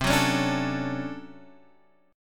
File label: D Major 7th, C; Dmaj7/C strummed